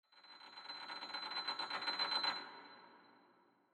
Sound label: high shimmering metal sound (B)